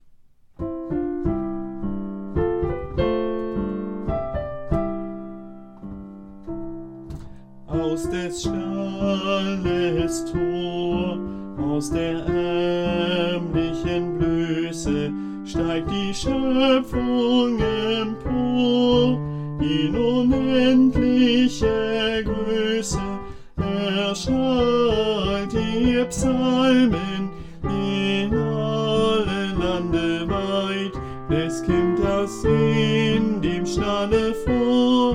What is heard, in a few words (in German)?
Eilt herbei, Strophe Sopran